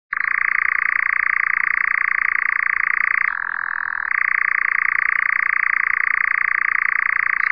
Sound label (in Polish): lelek; śpiew